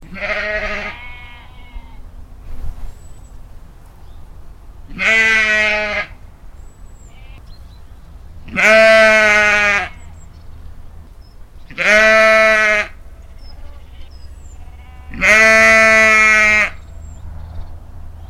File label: sheep; SuperTuxKart; scotland